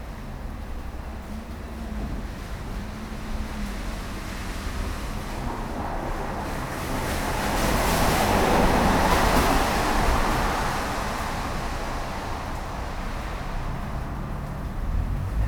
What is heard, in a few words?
rain traffic; 99Sounds; Rain And Thunder